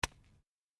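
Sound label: sounds of quadcopter drone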